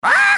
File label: squawk; Animal Sounds; Various Animals; Short calls